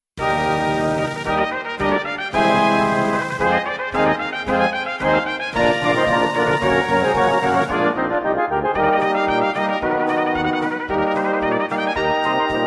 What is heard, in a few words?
trumpet